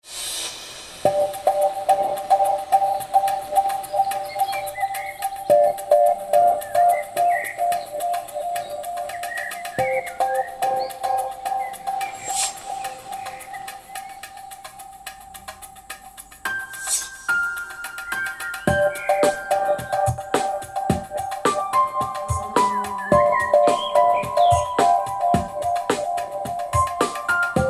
Stereo Musikaufnahme Quelle Lautsprecher

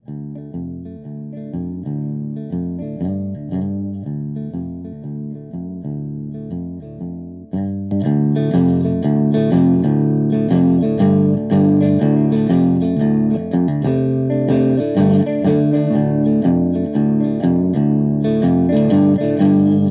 Verzerrer Pedale Tube Screamer; Plumes

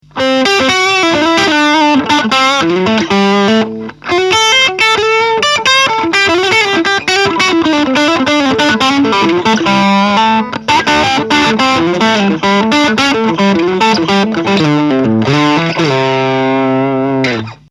Gretsch bluesy lead steg

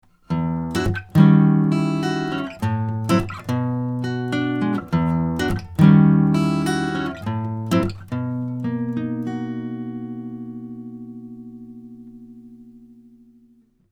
Black Lion Audio B173 Western Gitarre 57 dB Gain

